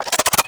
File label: Arch Dual Pistol Reload Mech; Warframe; Archwing